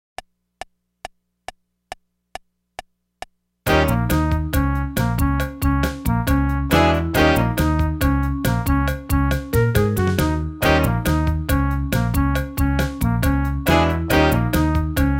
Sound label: jingle bells perf